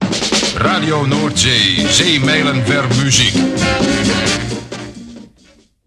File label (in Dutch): muziek; RNI